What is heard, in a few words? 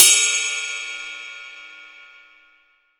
22 CUP, 22INCH ISTAN RIDE